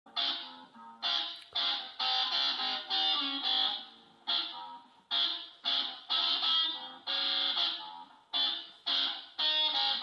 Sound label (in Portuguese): samba blues